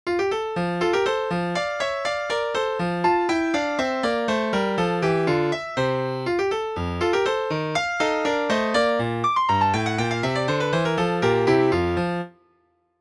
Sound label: Scherzo in F, piano